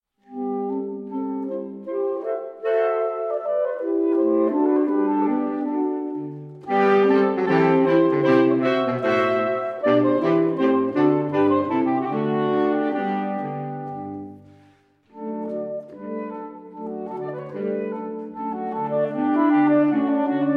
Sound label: Saxophone Quartet, Gavotte; Holberg Suite, Op. 40